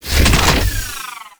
Anti Aircraft Gun Empty Clip; Warframe; Weapons; Archwing

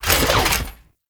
Body Roll, Foley; Warframe; Duviri; Dax

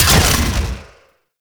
Layer Shot, Shotgun; Warframe; Weapons; Tenno; Revenant; Phantasma Prime

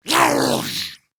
Spawn Grineer Ghoul; Grineer Ghoul Expired; Battle Barks

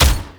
Step Small; Warframe; Corpus; Jackal Redux

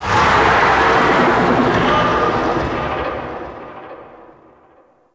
Distant Oni Vocal; Warframe; Cetus; Cetus Invasion; Ambience

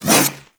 Kunai Whoosh; Warframe; Weapons; Stalker Kunai

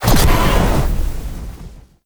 Void Dragon Firebreath Mech; Warframe; Duviri; Weapons

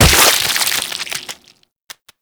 Grineer Mariner Zombie Burst, Death

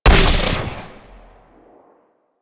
Amp Chassis Fire, Amplifiers; Operators; Corp Amp Set One

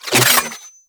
Hack Interaction In; Parazon

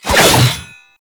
Bayonet Melee Spin Fast; Warframe; Tenno

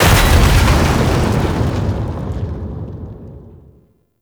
Arachnoid Camper Damaged Bodyfall; Warframe; Corpus

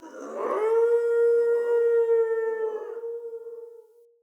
Wolf Cub Trapped Call Distant; Animals; Wolf Cubs; Orengal Wolf Cub A

